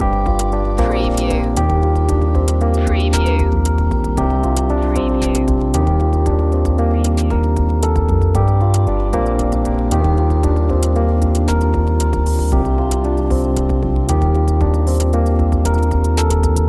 distance arriving loop

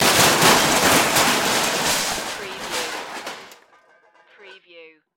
Crash Metal

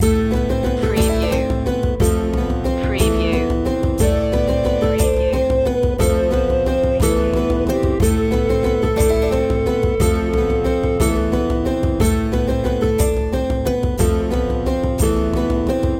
medieval dance loop

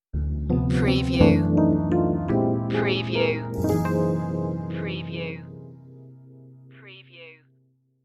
chilled dreams stinger

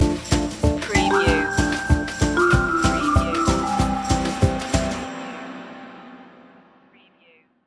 conifers stinger

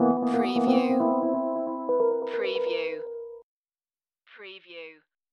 Hip Hop Dark Rhodes Licks 90 BPM Swing - E

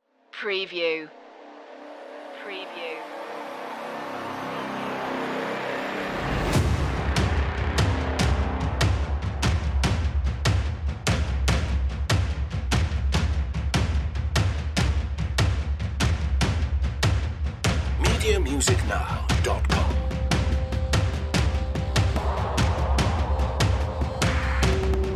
cyber ritual drums sound fx only

